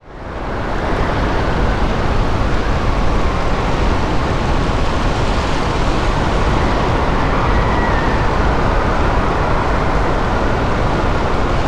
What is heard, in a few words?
Weather Ambience Hurricane Storm Sea Rain Thunder Waves Ocean Wind; AdobeCC